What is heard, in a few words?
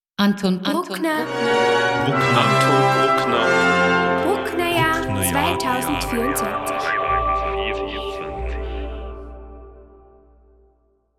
soundlogo